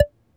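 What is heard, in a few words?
tongue ram, flute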